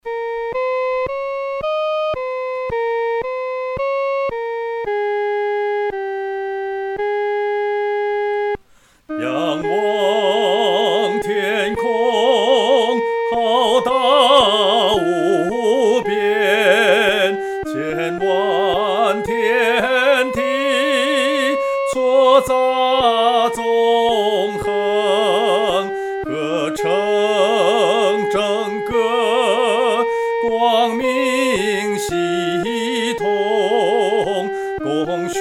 创造奇功 独唱（第一声）

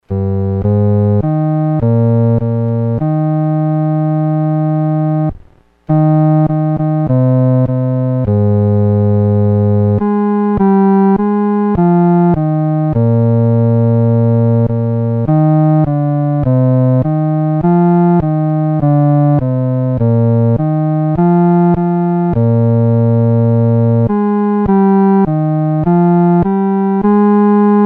独奏 第四声; Crown Him With Many Crowns; Matthew Bridges, 1800-1894

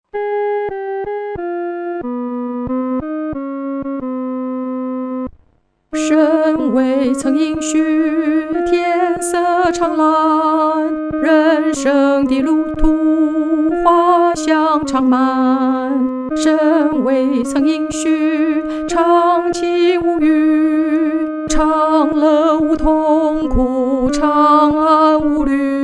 独唱（第二声）

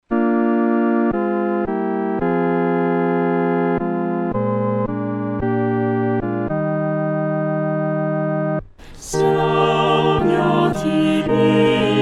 合唱 四声部

